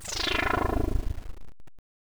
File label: relay teleport; Station-0